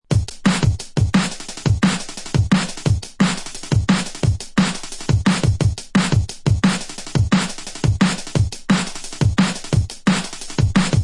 Dread Filter Steppaz; ray keith dread jungle breaks vol 1 60 beats for dubplate clashes battle junkies

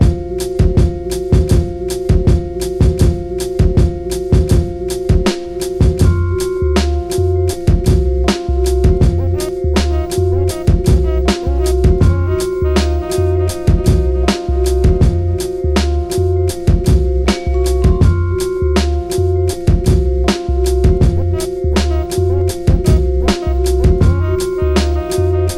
Instrumental

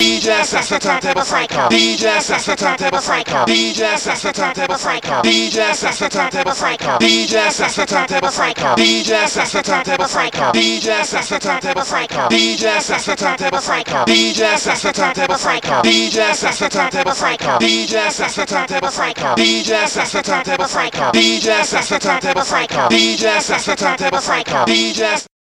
Acapella

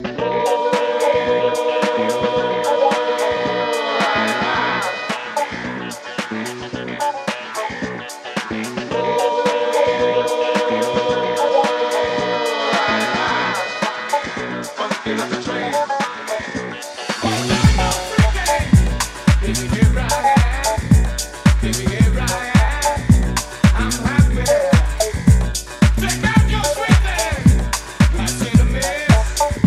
Groove Engine

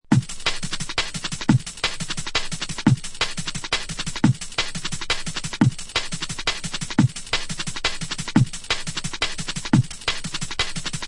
Dark Soldier Break; The Original Dark Soldier Break

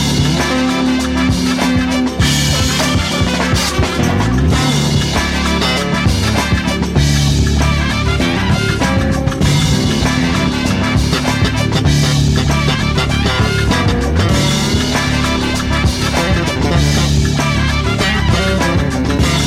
instrumental

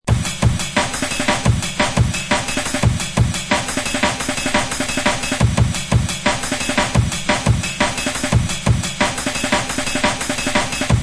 Daddy Raymen Hard Brov, beats; ray keith dread jungle breaks vol 1 60 beats for dubplate clashes battle junkies

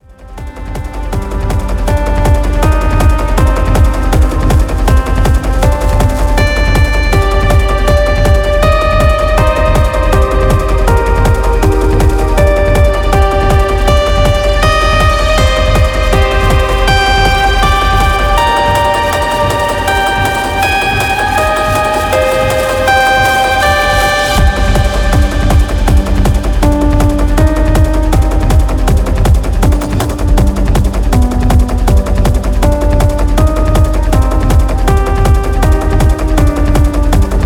Piano

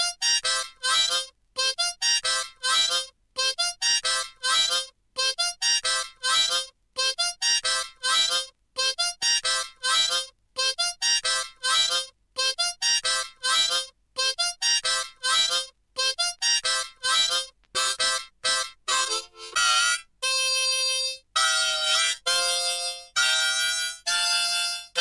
Harmonica

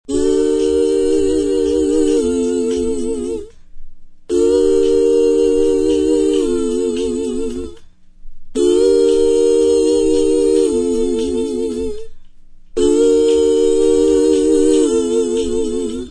(original acappella version)

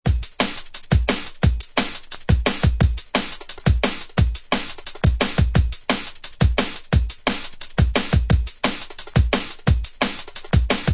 Step

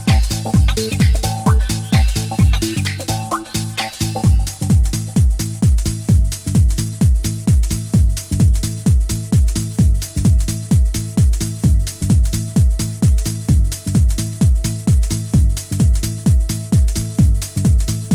Dolphin Splash Keyboards

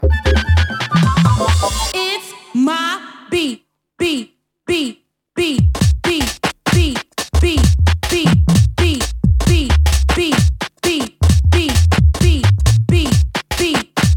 Private Beat (70% Shuffle)

